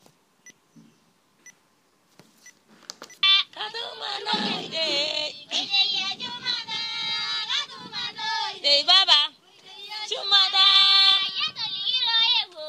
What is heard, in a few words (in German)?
Samburulied